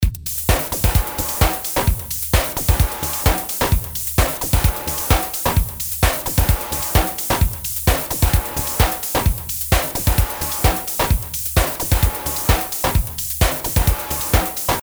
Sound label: stix 130 floor swing